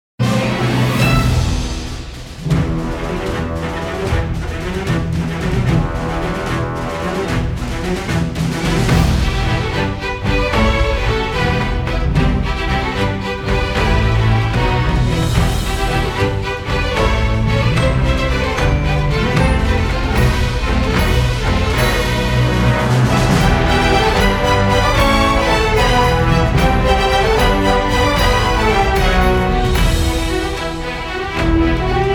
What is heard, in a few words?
Instrumental